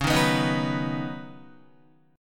Db 7th Suspended 2nd Suspended 4th, Guitar; Db7sus2sus4 strummed

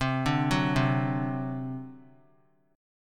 C Suspended 2nd Flat 5th C down; Csus2b5 arpeggiated

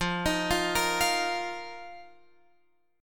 F 7th Suspended 4th F down Guitar Open F; arpeggiated

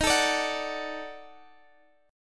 D-Major, D sharp; Dmaj/D# strummed

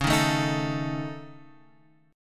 C sharp Suspended 2nd Sharp 5th, C sharp

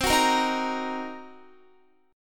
C Minor 7th Flat 5th Guitar, chord; Cm7b5; strummed

